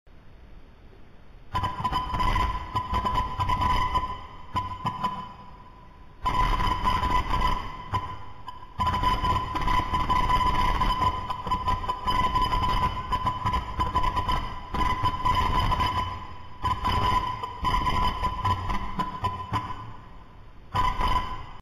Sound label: Signal